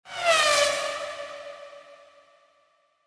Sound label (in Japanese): リバーブ